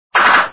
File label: Swish Triple; dad n me